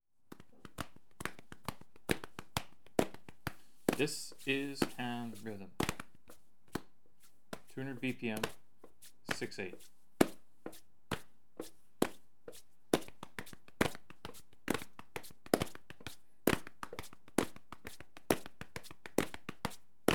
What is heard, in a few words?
Polyrhythm Hand Claps and Stamps; Jamuary